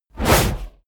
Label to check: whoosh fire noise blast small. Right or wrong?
Right